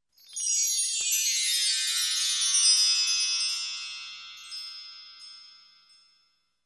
zvuk sijanija brilliantov

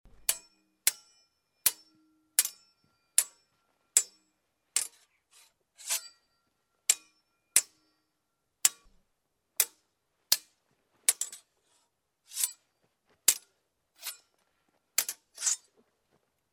fehtovanie

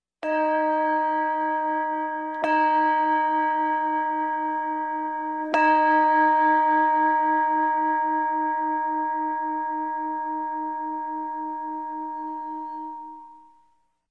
Tri udara kolokola

